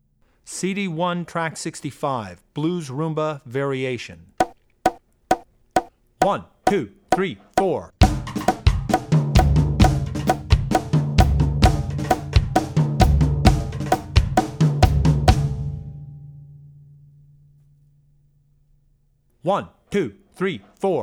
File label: Blues Rumba; Blues Rumba (Variation 1); Drummer's Bible Tracks